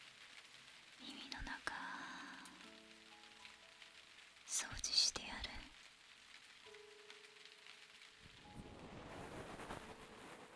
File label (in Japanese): 灰色の空と泥まみれの翼 左耳かき; 日本語